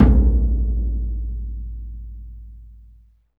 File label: AFRO, LRG DRUMS; Spectrasonics; Heart of Africa